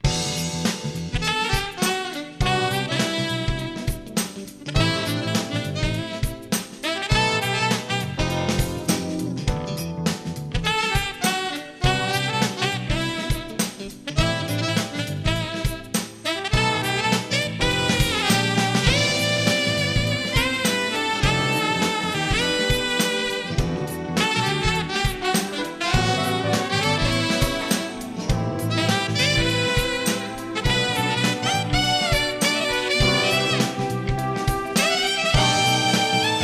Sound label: Sax; Megaman X